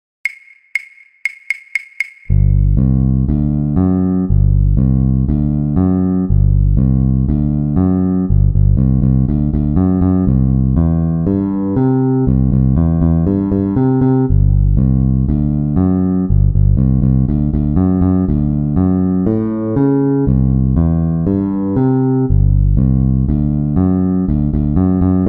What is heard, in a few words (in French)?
Rythme de Basse